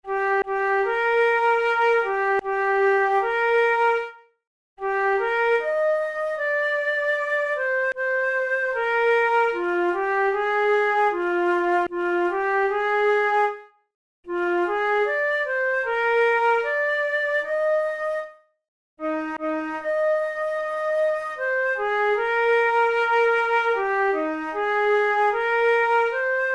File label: wiegenlied, flute; brahms